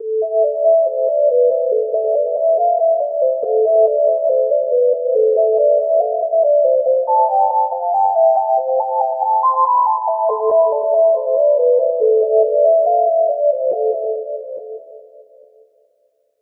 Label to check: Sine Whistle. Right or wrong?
Right